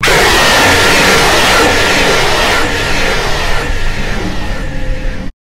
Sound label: reset jumpscare sound; night shift at samuels